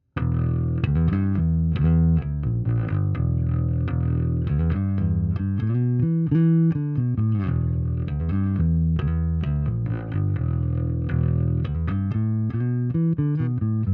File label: flat SVT410E sm57